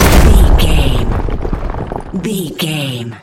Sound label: rock avalanche landslide debris